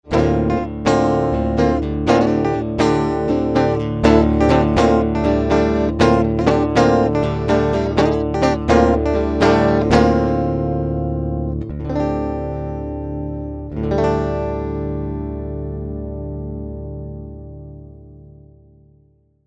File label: dadgad, Alt Tunings